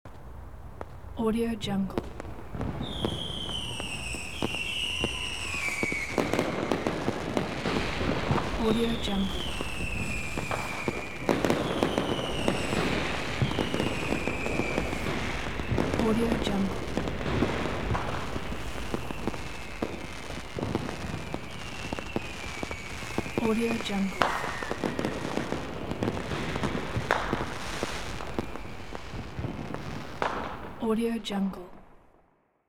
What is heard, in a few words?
fireworks